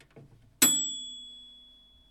microwave ding, A microwave DING recorded in a large room with stone floor